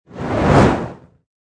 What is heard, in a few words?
Torch Movement Fireball Pass By, Strong fire whoosh from a ball of fire moving through air or; a torch swipe through air; Flame